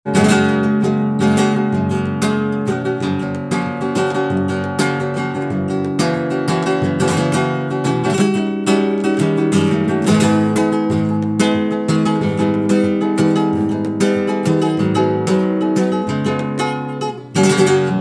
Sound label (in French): guitare Kremona epicea palissandre